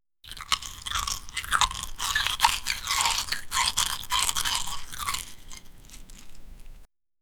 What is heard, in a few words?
chaching, coins